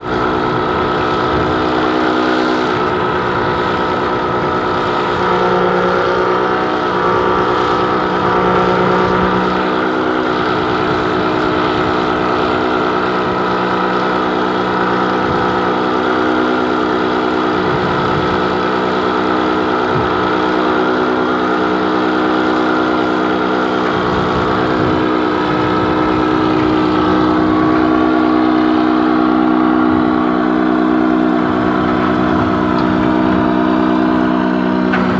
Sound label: industrial logging portable slasher operating; sound effects one